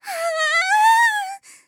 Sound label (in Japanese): "はああああん！", 小悪魔; ハイグレボイス集